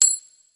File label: coin end